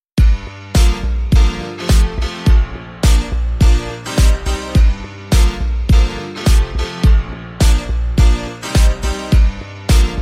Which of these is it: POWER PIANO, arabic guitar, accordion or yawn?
POWER PIANO